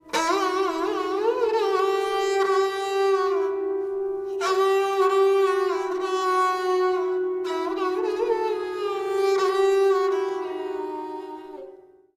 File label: sarangi; musique indienne